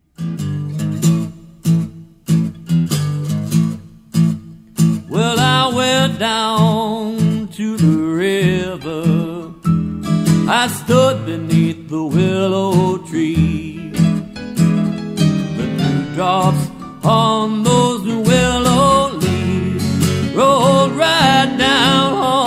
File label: Blues